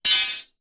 impact metal bar hit place down thin long

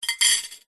household cutlery handful set down on plate; zapsplat

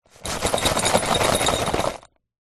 industrial large box of screws and nails shake

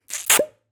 cartoon pull suction release pop; zapsplat

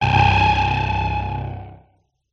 Blasts Synth Delayed Oneshot; sound spark

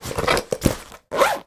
sport tennis racket put into cover sleeve; sound effects one

